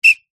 sports whistle soccer short burst

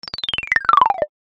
cartoon climb down descend fast steps ladder cute

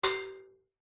impacts metal wire basket hit strike

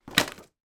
greeting cards pile drop on floor; foley

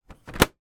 office printer paper access door close; hp photosmart c3180

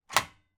household stairgate lock

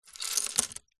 leisure lego pieces pick up from wood table; zapsplat

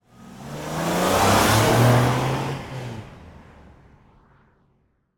transport car drive away fast pass

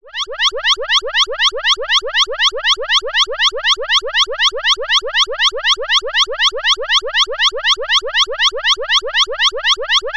emergency siren tone long; zapsplat

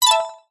game tone positive reward synth delayed; zapsplat

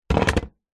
warfare gun handgun pistol set down on wood; zapsplat

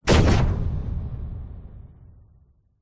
science fiction cannon gun blast

